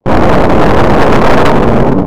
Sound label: jumpscare